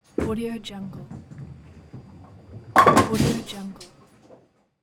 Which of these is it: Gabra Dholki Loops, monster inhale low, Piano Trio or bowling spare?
bowling spare